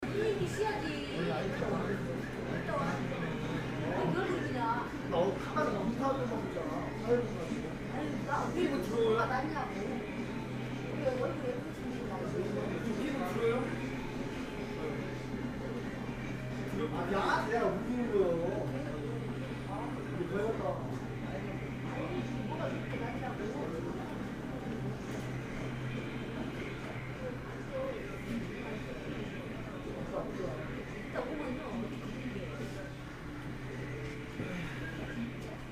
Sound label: PC room